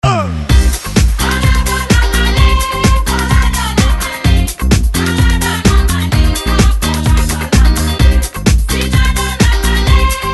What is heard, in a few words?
win